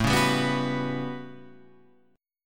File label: A 13th A down Guitar Half Step Down